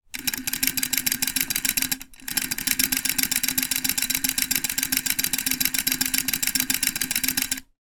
sewing machine toy vintage hand crank operating, household